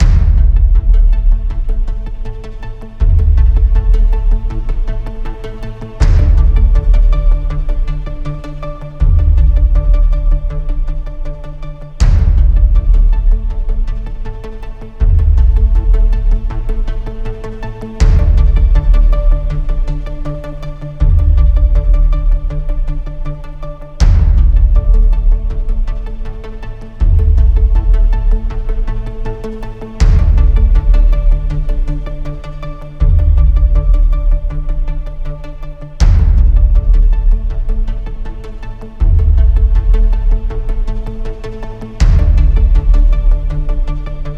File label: COMBAT TENSION Ambient